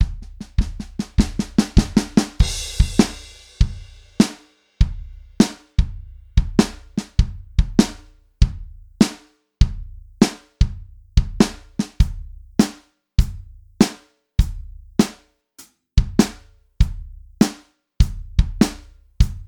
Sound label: blues swing kit